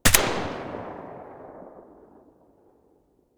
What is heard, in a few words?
galil silencer mono